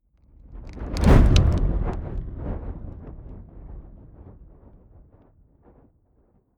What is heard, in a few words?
fire whoosh crackling hard; swooshes-swishes